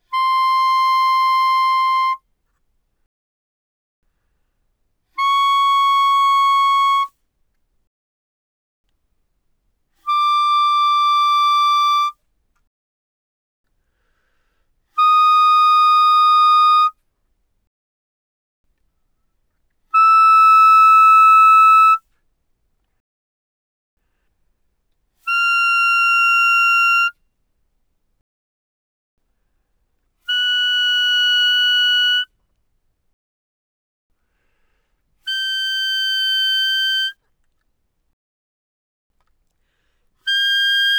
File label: Bb Clar ff C6 B6, Bb clarinet, woodwinds